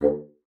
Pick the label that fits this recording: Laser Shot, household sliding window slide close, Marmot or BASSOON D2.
BASSOON D2